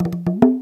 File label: TAMA, ETHNIC PERCUSSION; AKAI S6000 CD-ROM - Volume 5